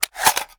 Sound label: ar reload empty xmag mag out, weapons; viper